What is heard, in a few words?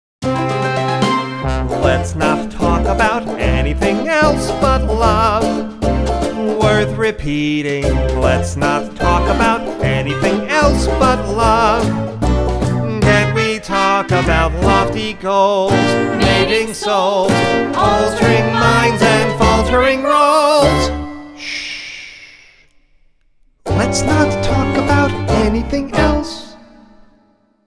But Love Reprise Guided Vocals